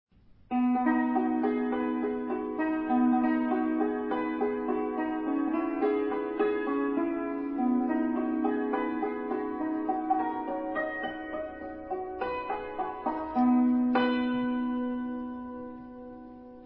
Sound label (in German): hackbrett